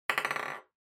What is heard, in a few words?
Drawing Box Ball Put